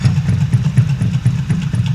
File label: MACHINES